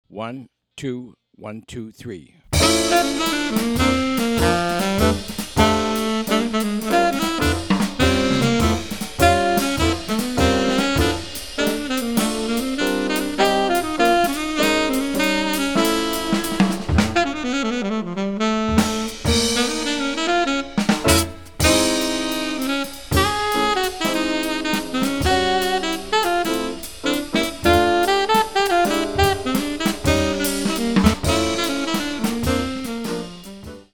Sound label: minus bass